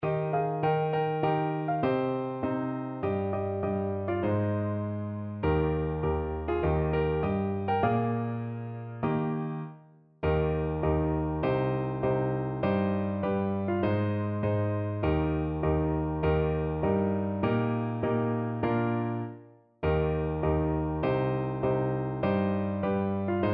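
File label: Battle Cry of Freedom, alto saxophone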